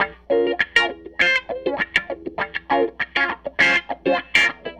Strat Guitar Loop

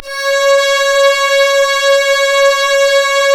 C#, strings; Big Fish Audio

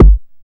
BD ANALOG; Sound & Vision - Gigapack II CD 2

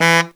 baritone fx; Zero-G; Phantom Horns

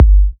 G, Kicks; markus hakala

